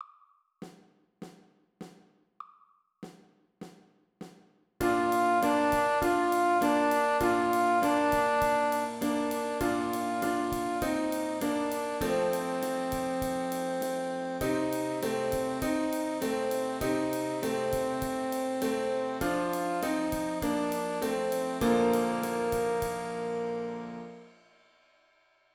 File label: Dreyle Dreydle Sax